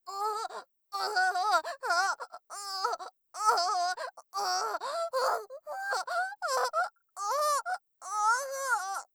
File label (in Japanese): "お゛～"; 効果音なし